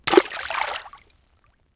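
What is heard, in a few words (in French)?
Splash Plouf petit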